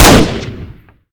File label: M249 Shoot; Modern Weapons Pack